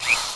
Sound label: attack; ambernite